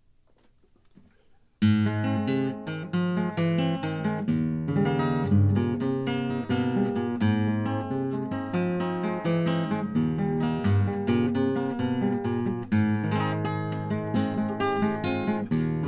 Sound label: Guajiras, classical guitar